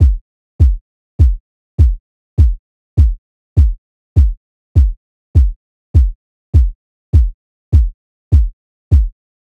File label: Drum Loop 101 BPM Kick; Loop Stems; Cymatics; DOPE DRUMS VOL. 1; Red Room